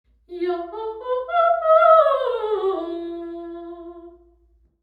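sing, impulse response, Hoffmann lime kiln Langcliffe UK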